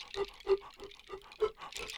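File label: Music Village Calm Near; From Dust; PS3; Xbox 360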